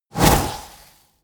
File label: whoosh